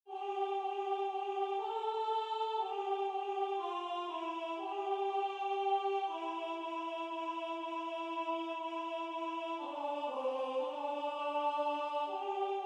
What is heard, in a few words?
Soprano